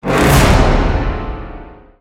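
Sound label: Music Failure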